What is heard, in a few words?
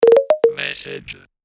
Message robot